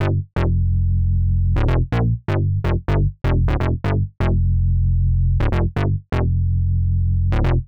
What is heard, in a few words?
Synth Bass A, 125; PowerFX - Nu Jazz House